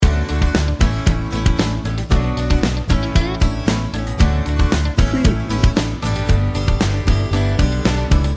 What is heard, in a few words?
breezy loop